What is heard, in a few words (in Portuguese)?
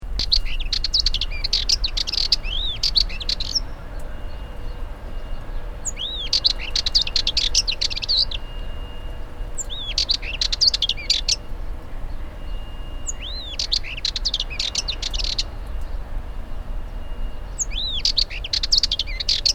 Canto do Toutinegra de Cabeça Preta